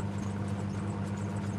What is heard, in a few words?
enemy move; Alpha-E-Corp